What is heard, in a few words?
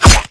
hit; novice